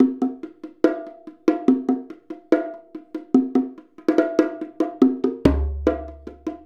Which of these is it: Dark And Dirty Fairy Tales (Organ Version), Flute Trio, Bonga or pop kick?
Bonga